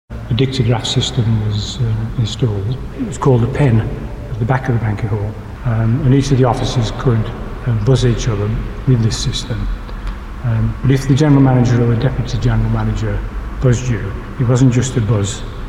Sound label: continuous buzz; david parkes